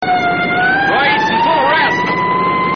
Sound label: full arrest with siren